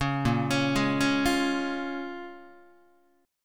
Bb Minor 7th, Guitar; Bbm7 arpeggiated

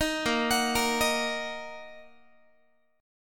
A sharp Suspended 4th Sharp 5th A sharp, Guitar; 4A,4E,5A,5D,5G#,6C#; arpeggiated

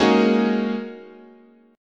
Ab 7th Suspended 2nd Suspended 4th, guitar chord

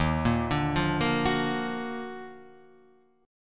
D sharp Minor Major 9th D sharp down

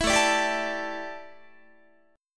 A 7th Suspended 4th, A, down, Guitar

